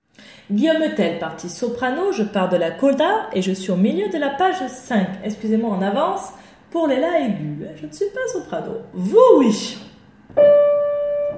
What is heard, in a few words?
Coda Guillaume Tell soprano